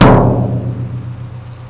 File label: guitar legato